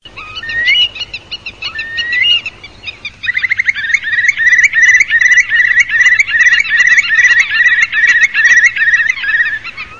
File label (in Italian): CHIURLO PITTIMA, birdsound